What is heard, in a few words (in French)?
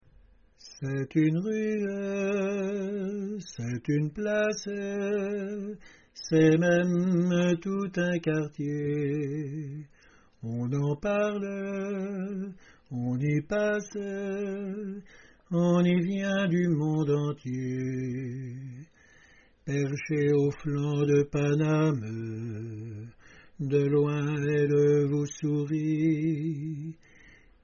alto